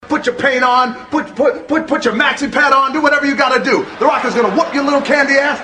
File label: whoop yo; the rock